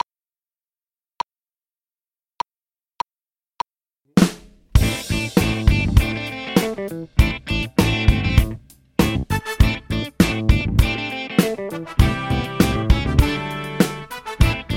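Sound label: GTR Drums, guitar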